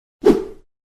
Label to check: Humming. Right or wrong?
Wrong